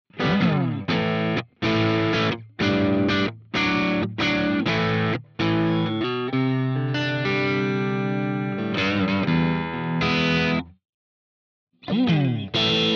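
Jackson Pro Plus Soloist SLA3 crunch Riff all positions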